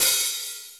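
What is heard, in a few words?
ROCK HAT OPEN, MPC-60 DRUMS; Greytsounds VOL-1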